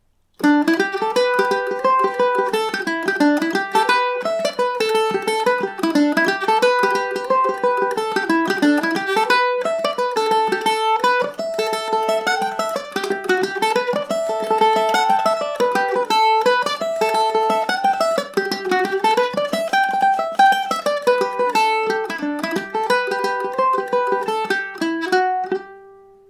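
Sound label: D mixolydian, Irish mandolin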